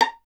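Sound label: STR violin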